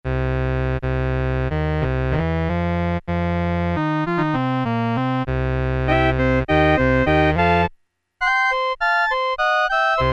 organ, Casio MT-68 preset sounds

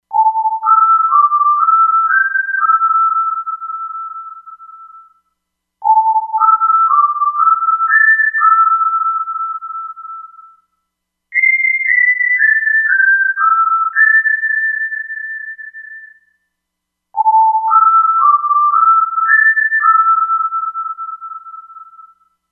Whistl'n, E-mu Proteus-2; Factory Presets

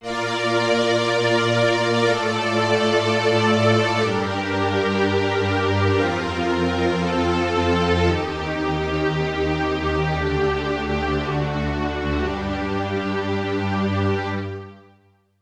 Legato Strings; Yamaha TX16W

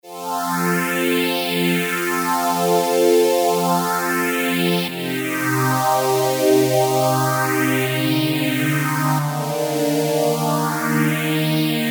Stream Pad, Korg MS2000; Factory Patches

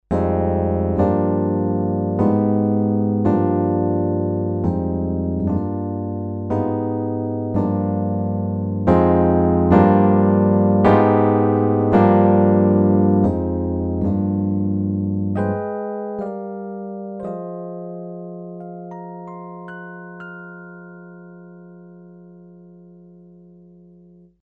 E. PIANO, Roland MKS-20

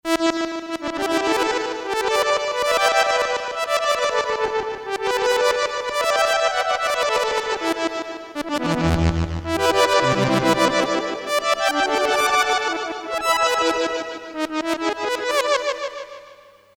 Brass, Korg DSS-1; Shorty

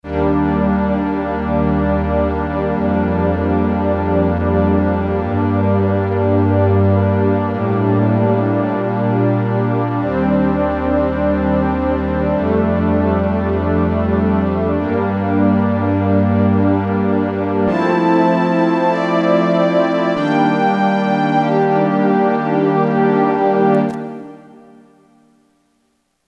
DWGS Bow, Korg DSS-1 synthesizer presets; Korg DSS-1 Sound Library

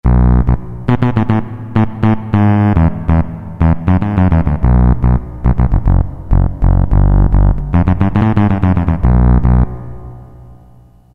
Elec Bass 1; Roland MT-32

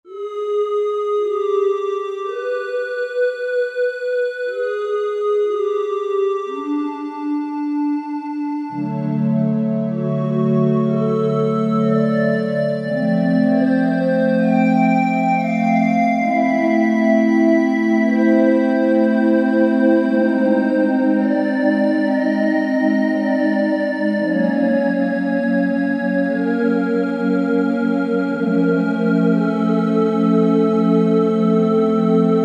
Syn Vox, Korg Wavestation A-D

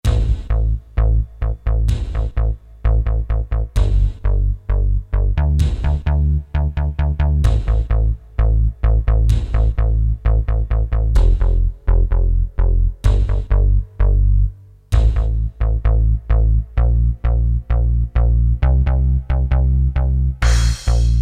Korg DSS-1 Synth Bass & Drums

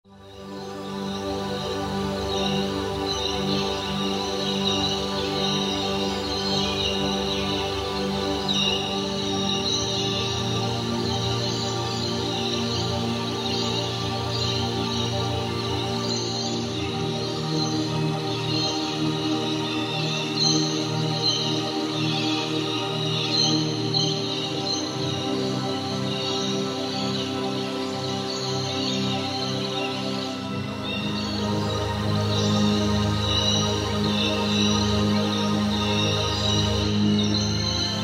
Roland V-Synth; Bird Island; Factory Patches

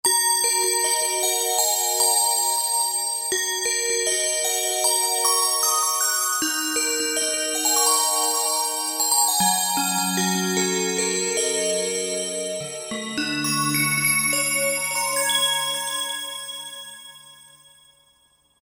Clear Bells, Roland JD-990; Factory Patches

